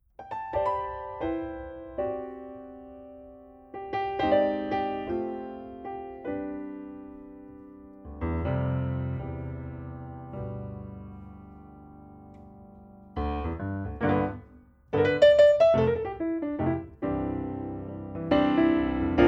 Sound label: lct 540s piano; lewitt